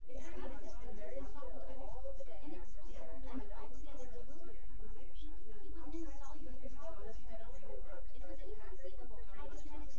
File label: female synth conv sim; SASSEC07